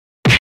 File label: Punch Face Hit Sharp Impact; Fights Bodyfalls